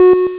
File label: Collect; BoulderDдsh; ClassicC64